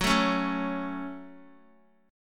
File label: F sharp Minor, Guitar; F#m strummed; x,9,7,6,9,x